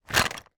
household makeup items in small cloth bag movement